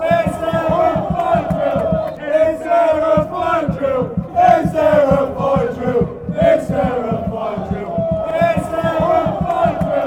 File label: firedrill, chant; stevenage